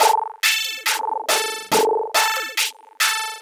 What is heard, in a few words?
140 Synth A, 140 BPM; loops; CHIPSHOP DELUXE